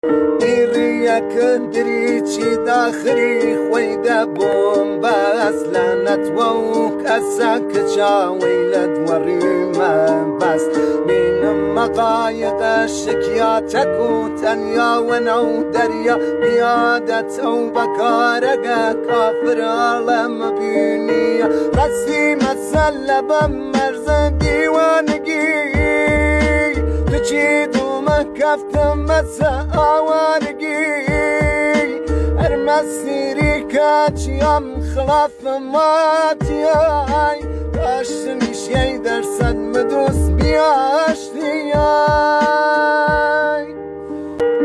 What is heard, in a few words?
kordi ba gitar; kordi ghmgin